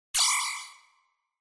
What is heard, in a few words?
Accent